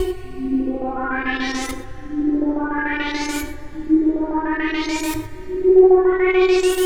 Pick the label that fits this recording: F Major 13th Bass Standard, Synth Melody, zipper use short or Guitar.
Synth Melody